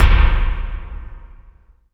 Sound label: Alesis DM5 Kick